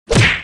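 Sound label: punch sound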